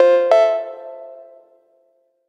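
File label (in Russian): предостерегающий звук установки опасной программы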